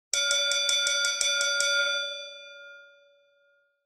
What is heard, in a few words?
Boxing Bell